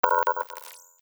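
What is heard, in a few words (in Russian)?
басовая вибрация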